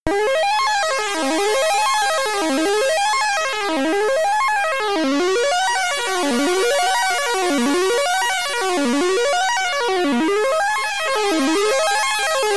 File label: SID Stereo; brain artifice; commodore 64